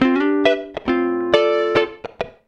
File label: 104 FUNKY, Electric & Acoustic Guitar Loops